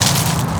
Tree Branch Perc, percussion; Lindale; HOUSTON

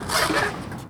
Skate Park Skateboard, percussion; Jamail Skate Park; Houston

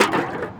Monster Can With Liquid, percussion; Houston; Moody Park

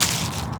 Tree Branch Scrape; Lindale; Houston

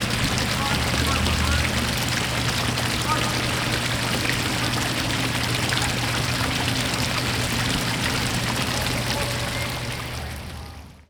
Water Feature, water; Downtown Houston; Discovery Green

